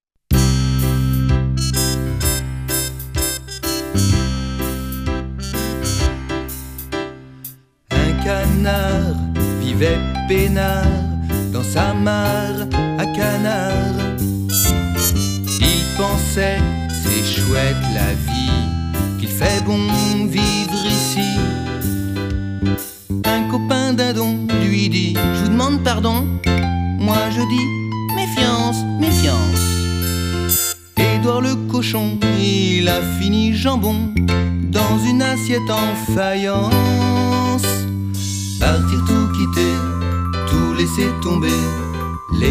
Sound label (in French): ensemble vocal variété jazz; chant du monde